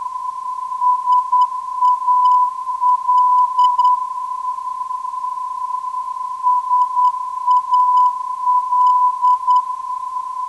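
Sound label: non-directional beacon; SAL; 421kHz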